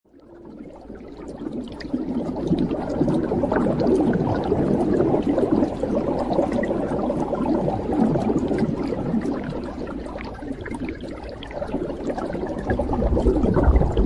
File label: ocean